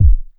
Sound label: Kick; Ghost Fader; pitchglitch samples